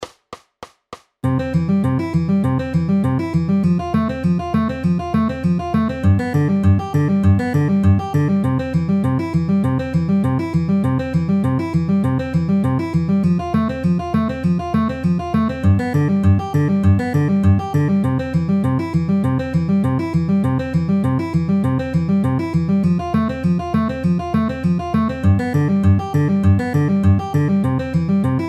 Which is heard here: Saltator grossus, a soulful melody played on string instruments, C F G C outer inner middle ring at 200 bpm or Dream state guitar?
C F G C outer inner middle ring at 200 bpm